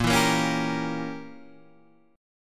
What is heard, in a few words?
Bb Diminished 7th Bb down Guitar Half Step Down; chord shape